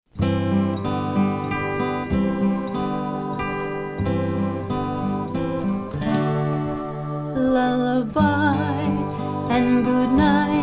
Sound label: lullaby and goodnight vocal; brahms lullaby song